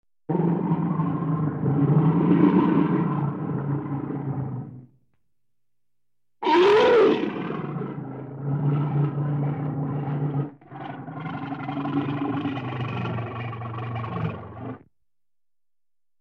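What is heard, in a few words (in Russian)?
rychanie medvedja, bear growling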